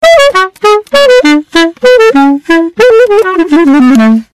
Clarinet Nokia, ringtone

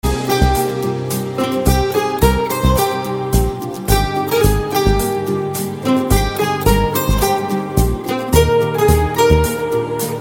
Romantic Music

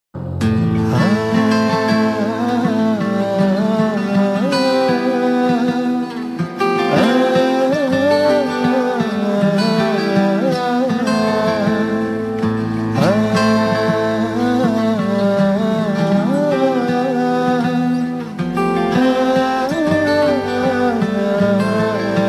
Theme Instrumental; TV Serial Tone; Life OK

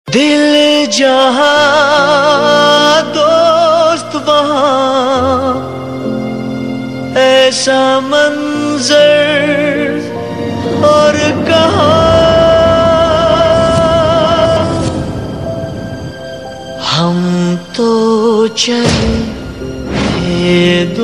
Sad Title Tune; TV Serial Tone; Star One; Dill Mill Gayye

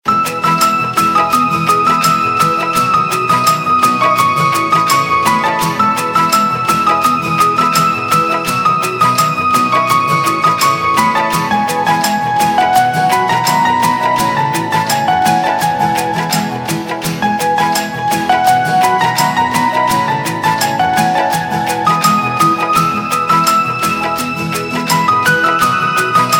Instrumental Ringtone; Bollywood Hits (2014)

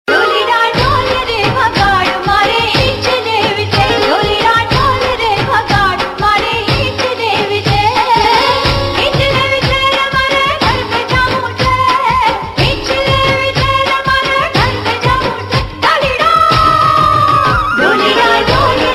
Garba; Gulaal; Star Plus

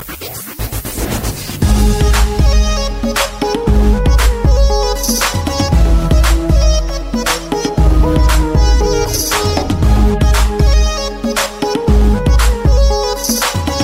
Superb U Music

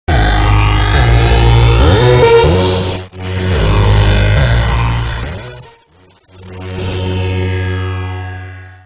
Electro 3d Sms

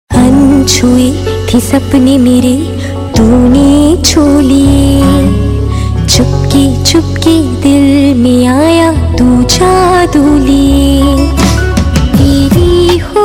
Duet Version; TV Serial Tone; Sony; Kuch Rang Pyar Ke Aise Bhi

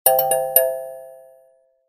Jingle Tone; Hike

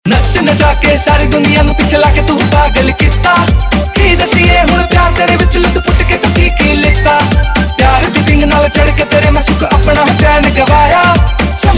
Disco Bhangra Ringtone, Punjabi

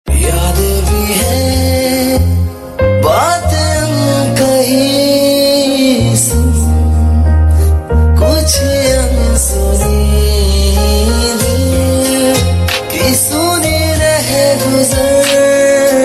Male; TV Serial Tone; Channel V; Mastaangi

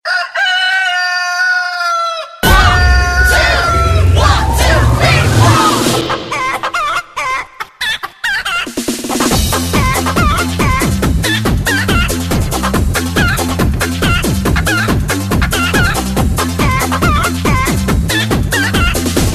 Hen Music Mix

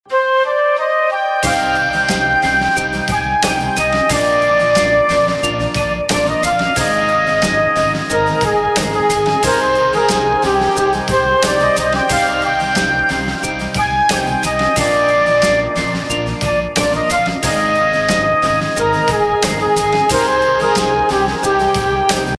Instrumental Ringtone; Bollywood Hits (2014)

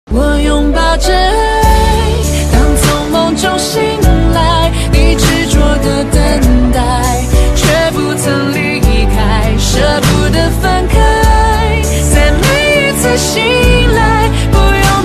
English China Music

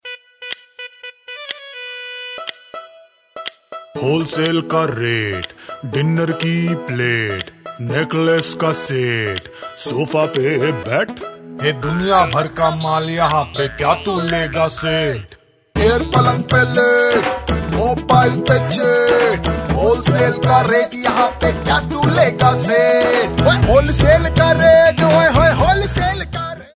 wholesale ka rate TV ad song

